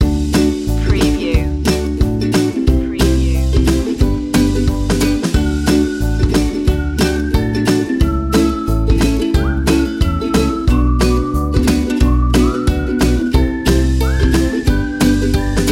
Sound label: uplifting and happy ukelele